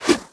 Pendulum Blades; Playable Tutorial Level

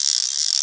Clockwork Beetle Click Whirr; Playable Tutorial Level

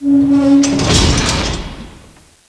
Hut Lowered; Playable Tutorial Level; TR1

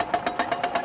Vehicle Minecart Pully Loop; Playable Tutorial Level; TR3

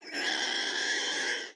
Warthog Death; Playable Tutorial Level

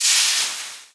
Steam; Playable Tutorial Level

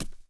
Knights Templar Walk; Playable Tutorial Level

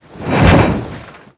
Bowl Tipping; Playable Tutorial Level; TR2

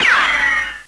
Ricochet; TR1; Larson

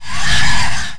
Wall Blades; Playable Tutorial Level; TR3

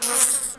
Wing Mutant Death; Playable Tutorial Level; TR3

